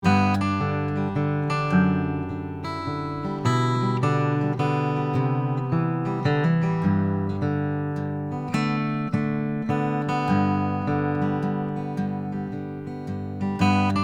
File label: Royer121 40cm A Guitar Picking